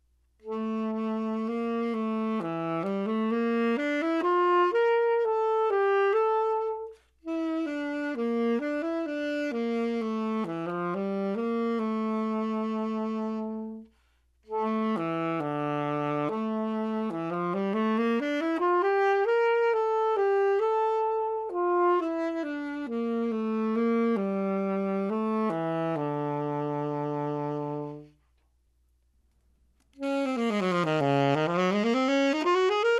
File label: alto